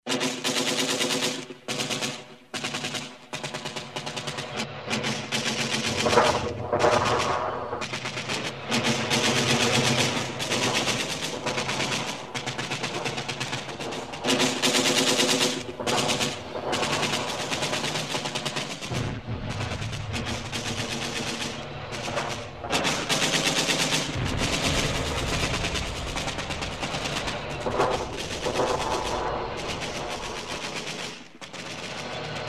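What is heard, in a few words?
war sounds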